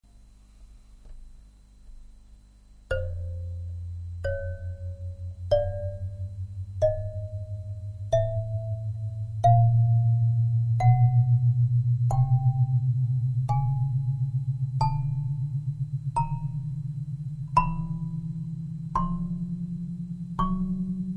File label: scale, kalimba; polon